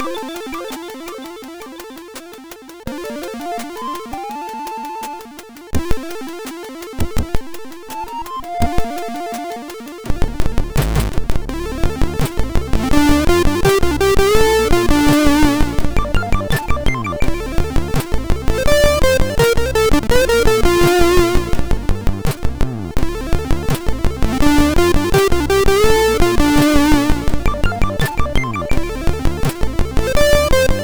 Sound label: Love Funk, sid MOS6581R2